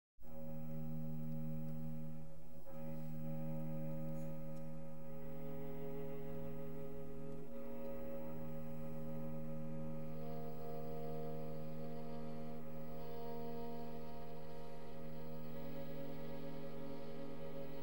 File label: String Quartet in E flat